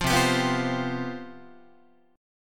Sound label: C Major 7th Suspended 4th, C, down, Guitar